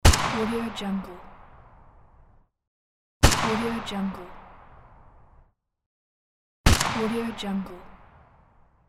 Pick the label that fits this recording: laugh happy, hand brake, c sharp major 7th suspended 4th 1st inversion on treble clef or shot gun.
shot gun